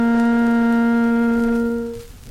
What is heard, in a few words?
Tuning Note; A Guide To Improvisation